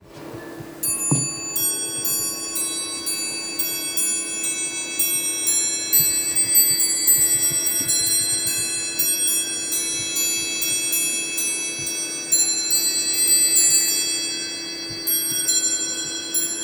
Musical Clock, Mechanical Instruments; Speelklok Museum; Allin Walker; 'Allemande'

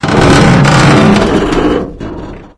coaster creak; random amb sounds

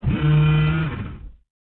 Mech Motor Run; Sid Meier's Civilization 4 Complete; Beyond the Sword; Units

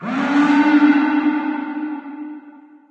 strider distant; Garry's Mod; hl2; streetwar

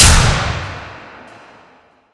arena lights off; ambient, atmosphere

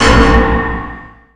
pickup scifi

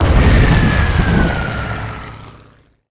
airplane burn; Hearts of Iron IV Death or Dishonor

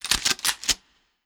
infantry rifle cartridge; Hearts of Iron IV Death or Dishonor

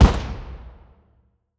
infantry fire standing

